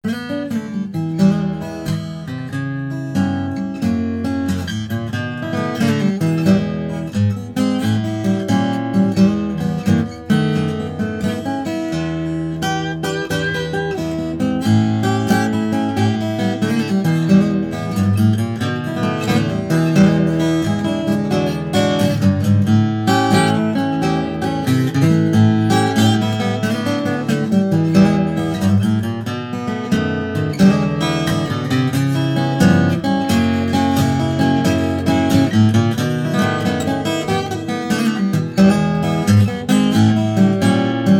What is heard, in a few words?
Blues, guitars